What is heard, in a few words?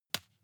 juggle catch soft